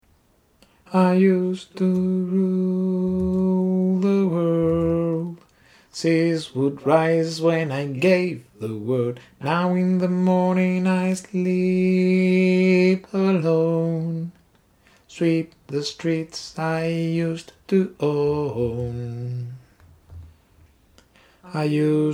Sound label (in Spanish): alto, coro